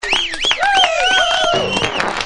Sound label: CROWD